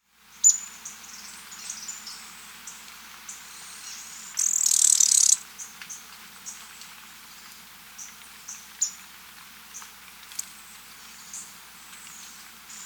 Song and call, Birds of the Costa Rican Cloud Forest